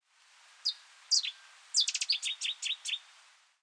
Cettia cetti

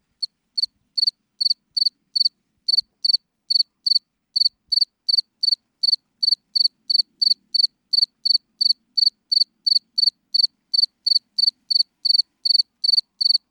Gryllus bimaculatus

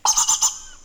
Macaca nigra

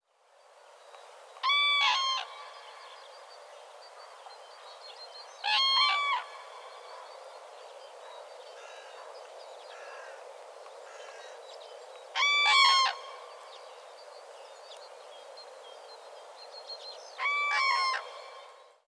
Grus grus

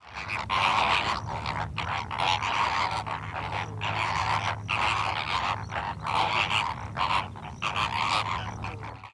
Flamingo

